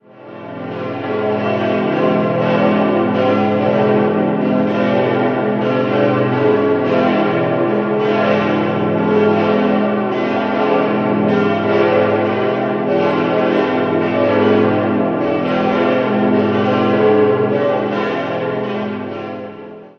Bussnang ref, Glocken

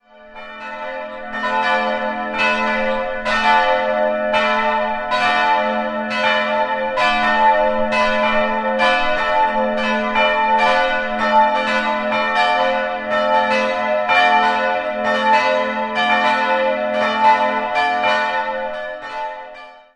Paulushofen, Glocken

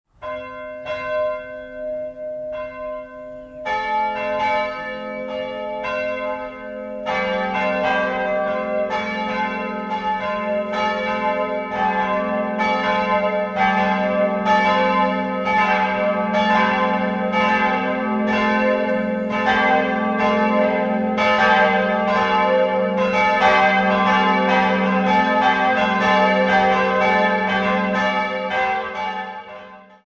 Ingolstadt, Glocken; Thomas

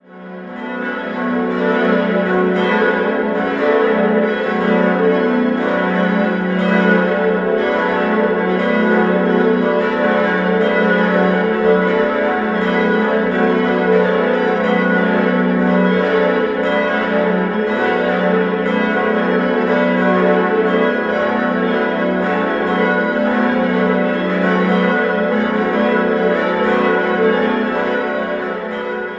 Eichstaett, Glocken

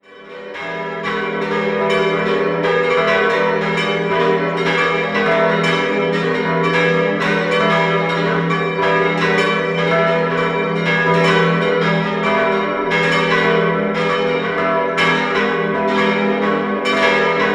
Dieterskirchen, Glocken, Pfarrkirche; Pfarrkirche St. Ulrich; Glocke 1 im Jahr 1947 von Gugg in Straubing und Glocke 2 im Jahr 1960 von Georg Hofweber in Regensburg.

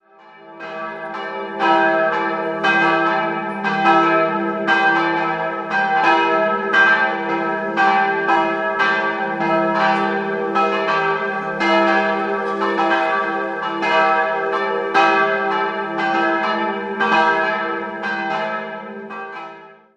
Kareth, Glocken

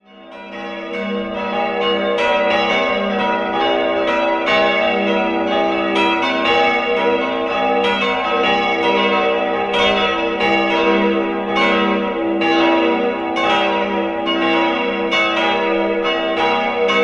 glocken, Pfarrkirche; Kevenhüll; St. Ulrich; Nürnberg; 1684; Wolfgang Hieronymus Heroldt; kleine Ulrichsglocke

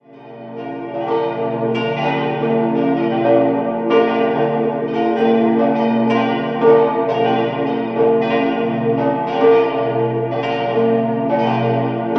Benediktbeuern, glocken, Pfarrkirche St. Benedikt; barocken Glockenbestand; Rudolf Perner

